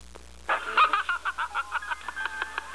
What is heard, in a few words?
laugh; zoisite